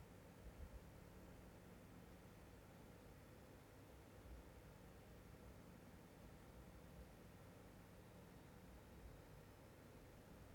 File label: noise; Nvidia GeForce RTX 5070; Gigabyte GeForce RTX 5070 Gaming OC 12G (12 ГБ)